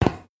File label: footstep grate